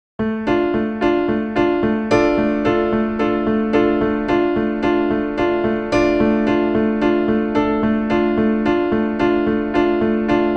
piano and drum experiment

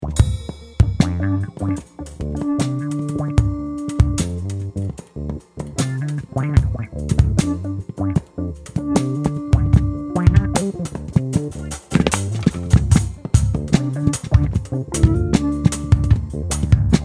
funk; rhren codex; weeklybeats-2014

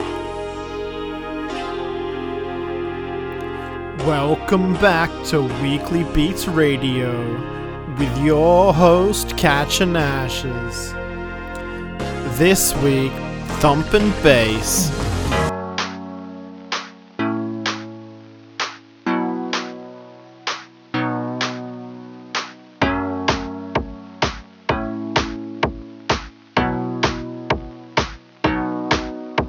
thumping bass; weeklybeats 2026

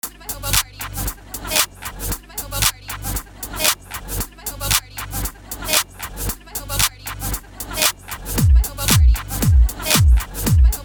hobo party 115bpm; weeklybeats-2016

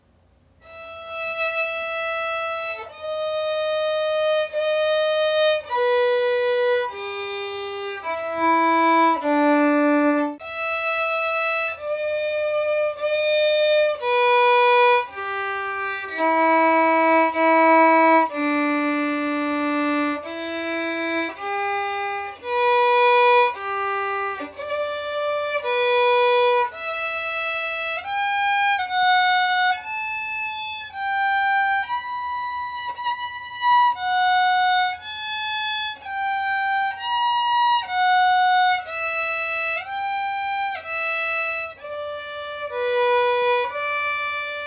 sad violin; weeklybeats 2026

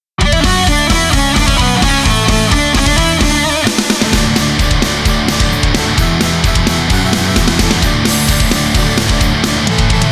metal chip version; guild ho; weeklybeats 2012

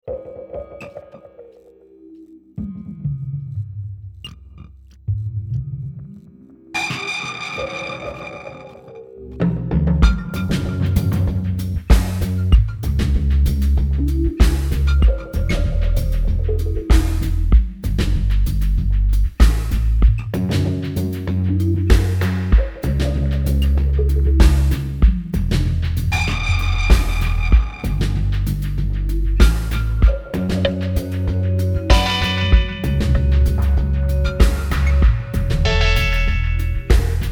burning dub; weeklybeats-2016

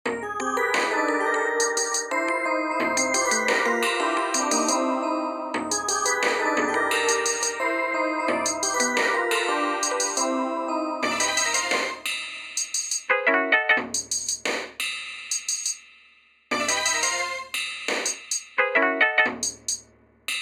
carotid static

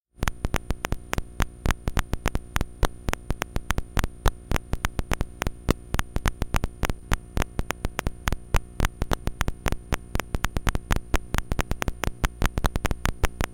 pan noise tempo noise circuit bent gameboy noise; weeklybeats-2018

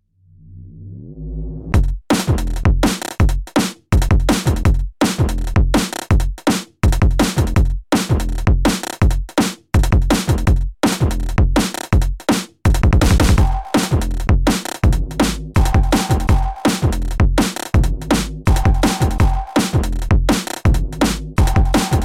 mallet song

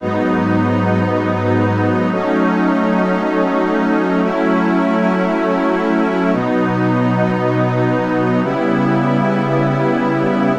strings; weeklybeats-2018

